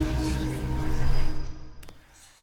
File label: Idle Hover Magic; smite; izanami